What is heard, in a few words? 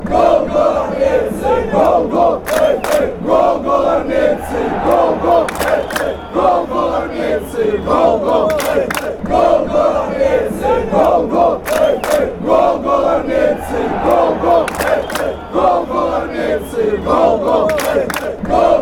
chant